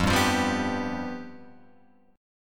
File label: F Suspended 2nd Flat 5th, Guitar, Open F; strummed; JGuitar